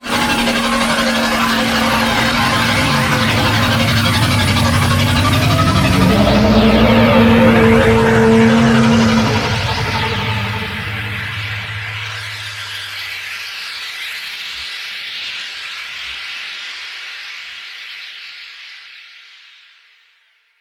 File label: Liset Level Undocking, Vehicles; Warframe; WM Liset